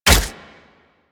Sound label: Nunchuck Catch, Weapons; Warframe; Infested